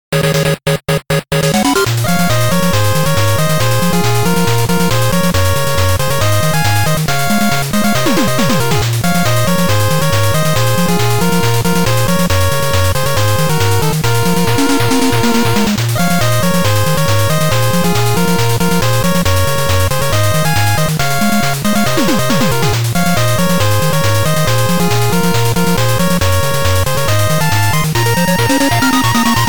8bit stage

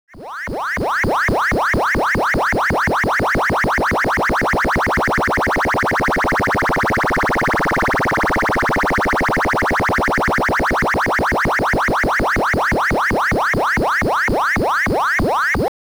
charge

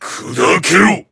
monster attack

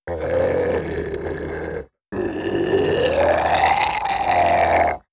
zombie growl

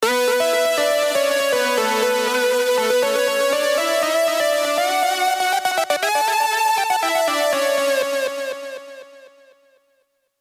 delay lead

